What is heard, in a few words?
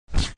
close